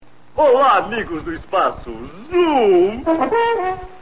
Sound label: zooooooom